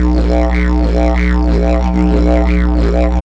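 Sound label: DIDGERIDOO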